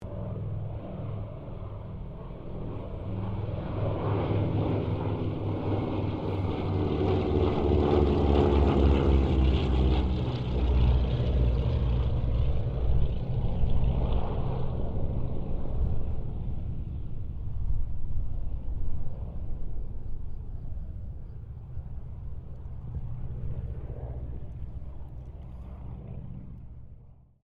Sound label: C195 squadron 2 pass, Airplane; Mixed aircraft squadrons